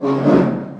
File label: Magic Frozen Fire; Eft